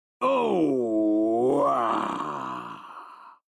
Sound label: oouah; for honor